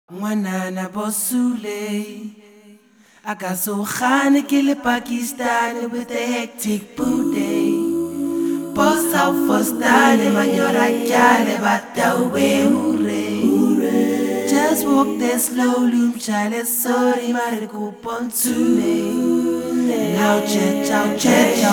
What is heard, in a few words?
Tshwara Ballad